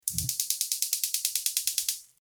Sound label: gas stove